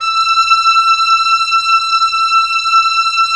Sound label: WND OB F, Oboe f; Roland - LA Composer vol. 4